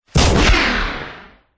sound design hit crunchy punchy impact with a whooshing tail; zapsplat